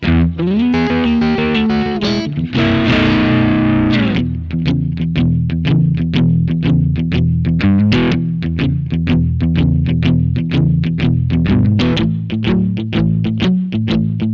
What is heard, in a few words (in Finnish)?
blues strato kaula ja keski; v-twin